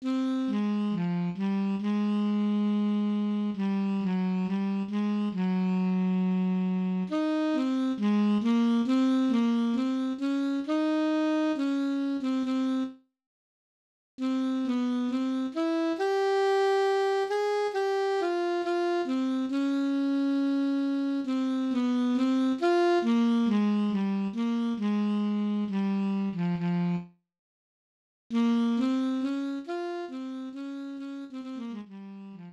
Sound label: tenor sax etude